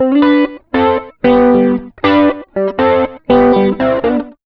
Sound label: rock, acoustic guitar loops; USB Soundscan vol.04 - Electric & Acoustic Guitar Loops; AKAI; 120B.ROCK